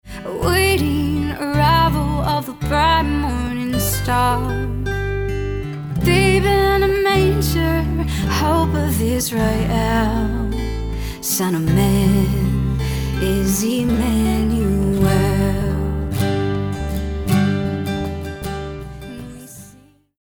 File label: ACOUSTIC MULTI